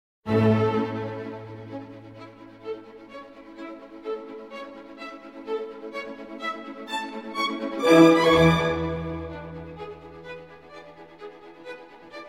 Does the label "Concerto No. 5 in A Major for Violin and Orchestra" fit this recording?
Yes